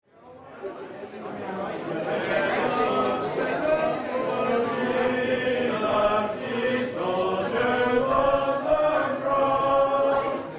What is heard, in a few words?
Stand up Stand up for Jesus hymn, Hymn Sing; 2015Conference